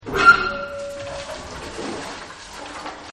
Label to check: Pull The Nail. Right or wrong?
Right